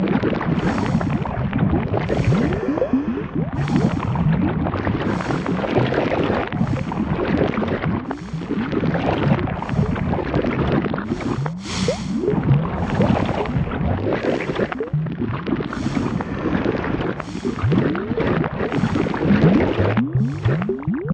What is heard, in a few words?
biochamber loop, factorio sound entity